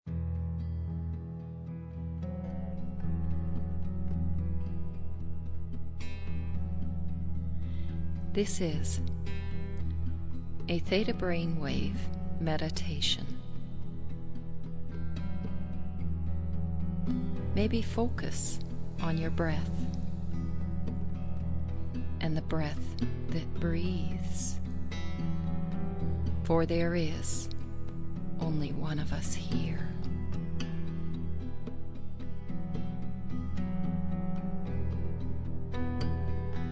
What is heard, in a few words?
theta brainwave meditation; inspiration